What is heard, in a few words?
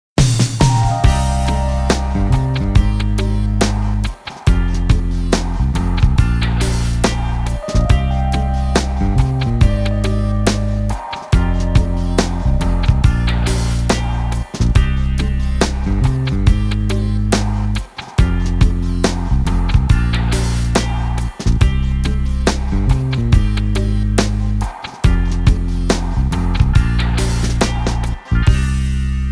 abstract lounge